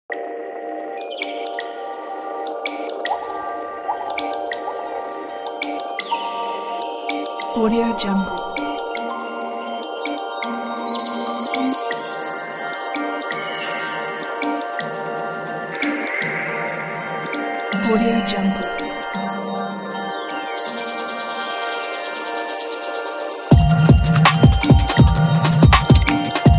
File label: deep electronic showreel